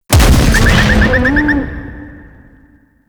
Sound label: Arm Destroy Explosion; Enemies; Warframe; Eidolon; Grn Bomber